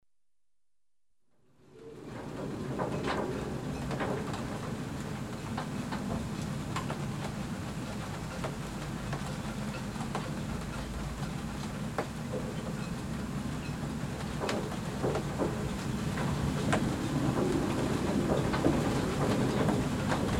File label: Galeforce wind and rain on yacht ( recorded in cabin); Weather; The BBC Sound Effects Library